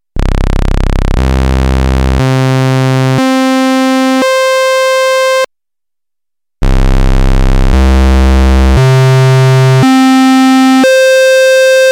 test octaves square, Moog minitaur

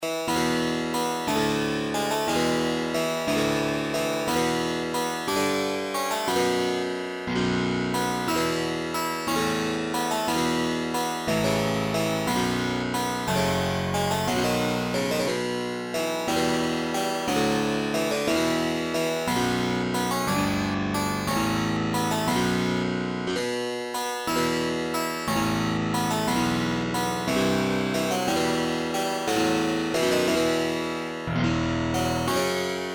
harpsichord, Theme by Akai X7000; Retrogames music Synth remake; Ultima IV - Quest of the Avatar - Origin (1985)

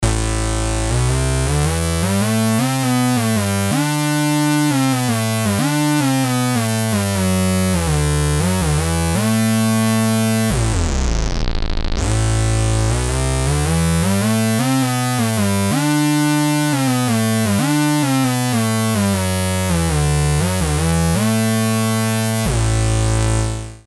synth impremoog; Polivoks formanta

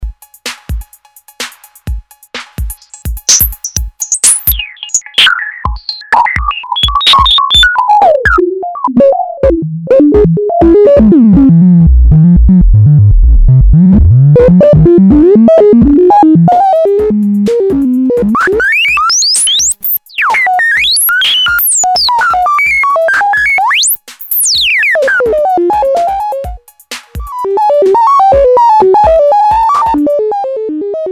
low pass random, electrix filter factory

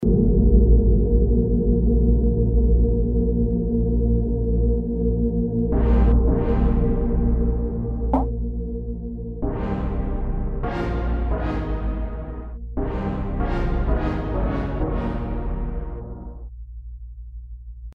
amb; Emu XL1 Xtreme Lead

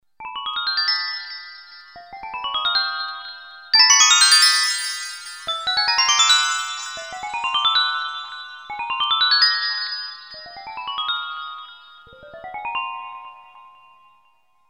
korg m1r synth

